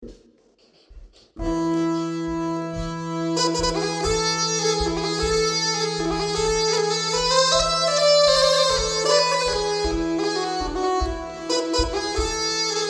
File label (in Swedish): Långdans säckpipa; efter Aina Liljebjörn Filipstad; roslagens spelmanslag